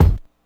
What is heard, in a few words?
kick, Hardcore Breakbeat